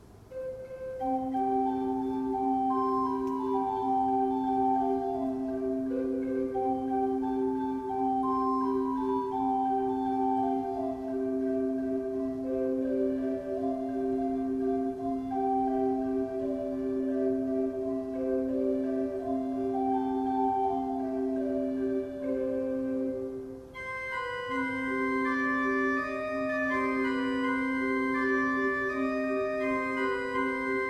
Baltes, gebrauchtorgel

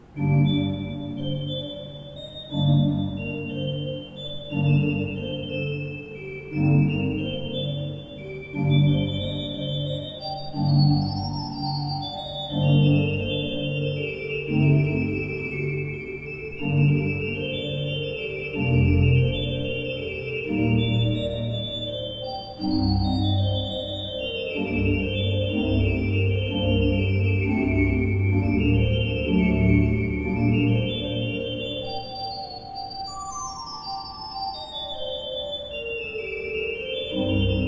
Beckerath, gebrauchtorgel

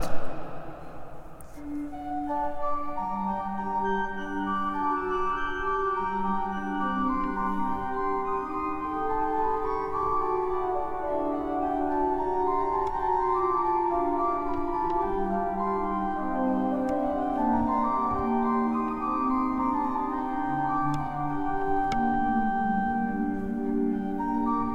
Orgel, pfeifenorgeln; Klais

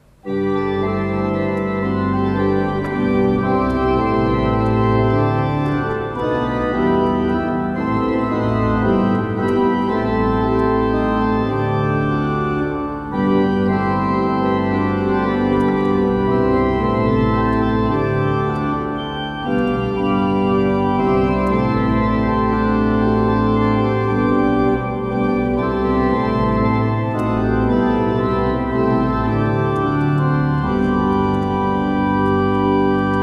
Niederlaendische Orgel

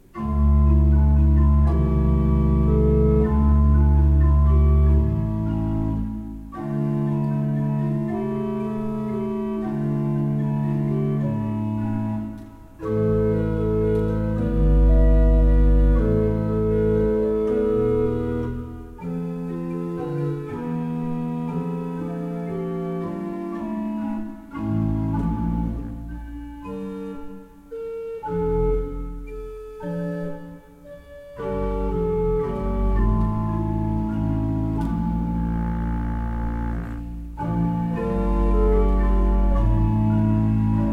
Breil, gebrauchtorgel

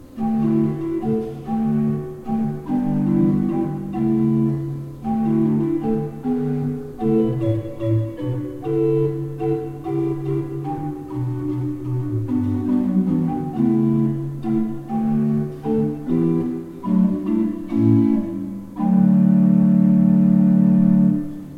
Vermeulen 5 I P, orgel

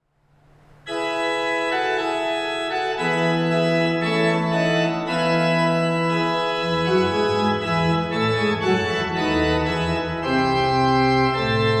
Orgel, pfeifenorgeln; RP-Gedackt 8'+Prinzipal 4'+Flagolet 2'+Mixtur

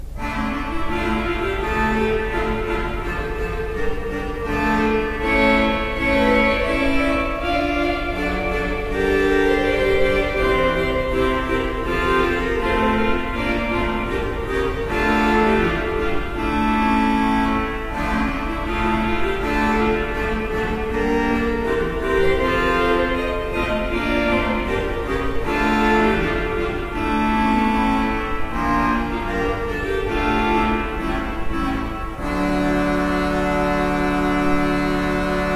Breil, gebrauchtorgel

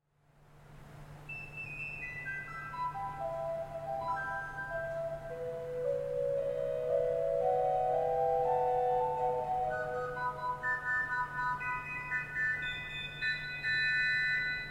Orgel, pfeifenorgeln; RP-Flagolet 2'

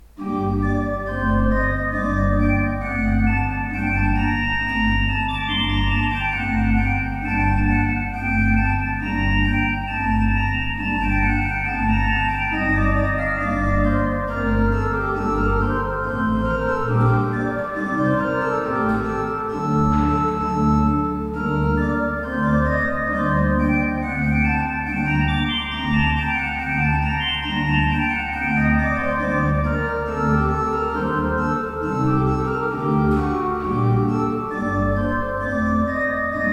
Hammer 22 II P, orgel

